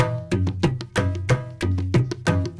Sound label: INDIA R, Heart of Asia, IND ENS; Spectrasonics - Heart of Asia CD2